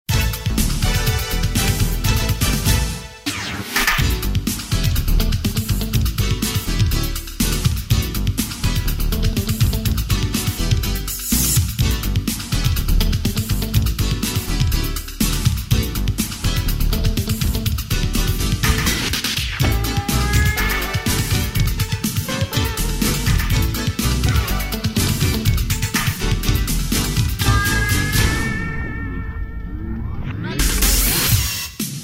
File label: Funky